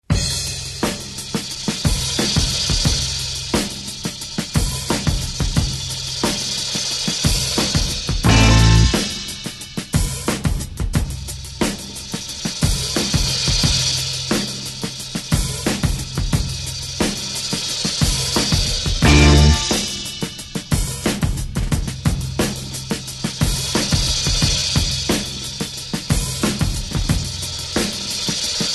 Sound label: news background